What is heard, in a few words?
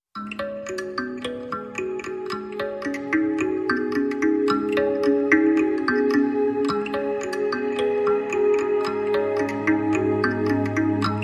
No Perc